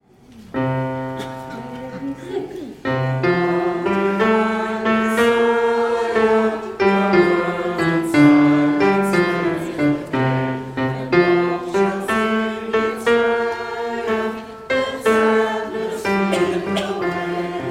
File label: O Come Divine Messiah - melody